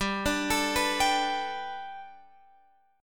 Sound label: G Major Add 9th Guitar Open F, chord; Gadd9; arpeggiated; x,14,14,16,14,14